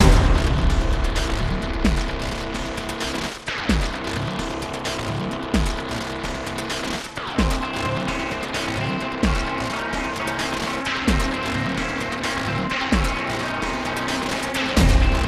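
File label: music, no brass strings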